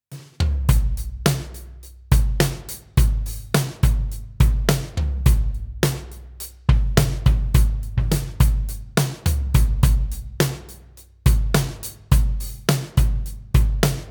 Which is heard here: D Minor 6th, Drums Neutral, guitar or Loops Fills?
Drums Neutral